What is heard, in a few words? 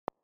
SOL 741 Hz; Expression Solutions